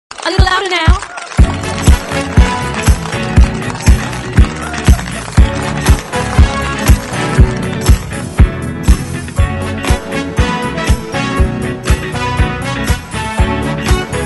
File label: club mixxy